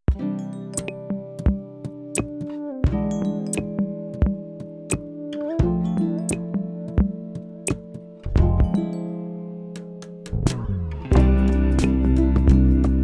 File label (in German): katze klasse musik